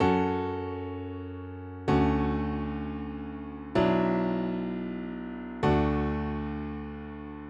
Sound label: piano